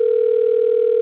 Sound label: phone ring